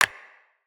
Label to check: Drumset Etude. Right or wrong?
Wrong